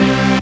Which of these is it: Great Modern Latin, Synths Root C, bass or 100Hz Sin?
Synths Root C